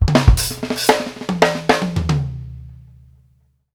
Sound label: HAT, drumworks fills; Sampleheads - New York City Drumworks VOL-1